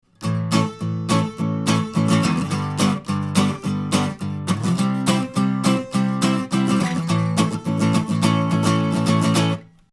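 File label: Schoeps CMC6MK2S Acoustic Guitar Strum 40cm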